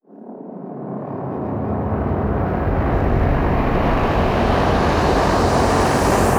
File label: Dark Rise